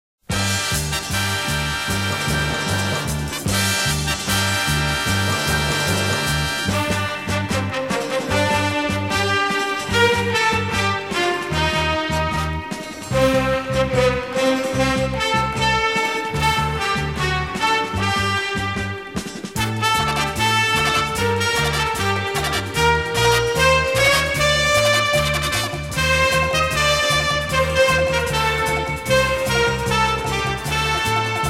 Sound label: Instrumental TV Version; Iron King OST